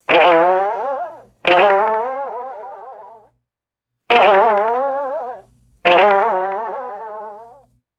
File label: two comical wooden twangs; cartoon